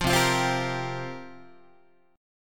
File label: C 7th Suspended 4th, Guitar, chord